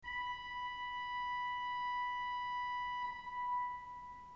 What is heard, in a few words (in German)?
ton, orgel